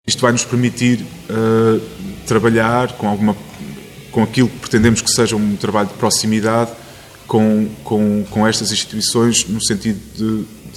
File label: JAZZ